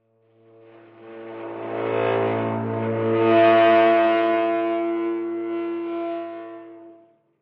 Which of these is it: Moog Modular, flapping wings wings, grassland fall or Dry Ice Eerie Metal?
Dry Ice Eerie Metal